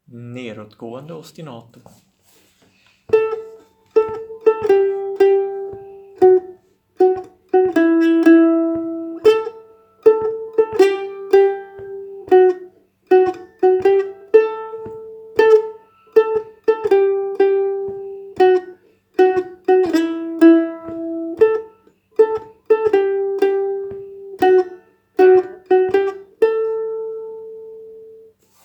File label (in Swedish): Polska fran Jamtland, ostinato